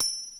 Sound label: BABY CYMB, DANCE PERCS; Crypton; DrumBase5000